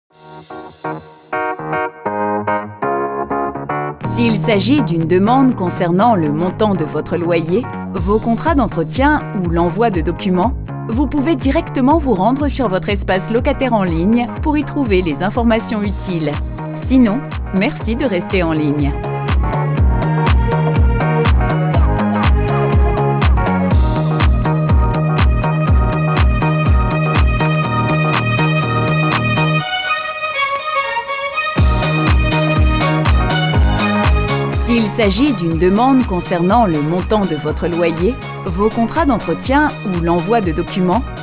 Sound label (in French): HARMONIE HABITAT ATTENTE